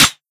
flavor metal, bullet impacts; physics; Half Life Model Pack

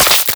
spark tiny

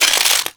fs fm glass, footsteps; player; Portal 2

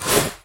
headcrab cage tweak; scripted sequences; hideout

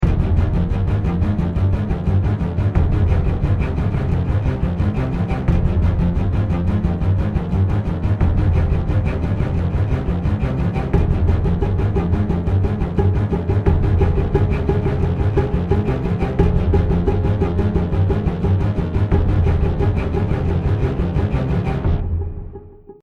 start round, music

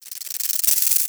rattle warning layer, headcrab

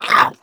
ballbot pain sm; player; Portal 2

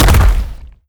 stomp, antlion step

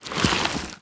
close, zombie blind, foley; creatures

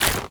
zombie move short, foley; Half Life Model Pack

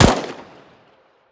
famas, weapons; Counter Strike Model Pack; CS:GO

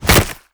headbutt, zombie impacts; creatures

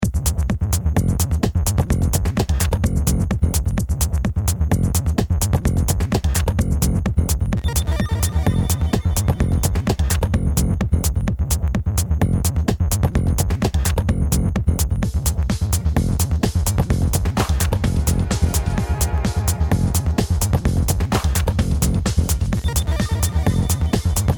bomb planted; music

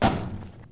metal grate, footsteps

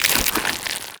zombie move, foley; creatures; Half Life Model Pack

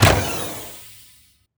bumpmine launch; Counter Strike Model Pack; CS:GO; survival

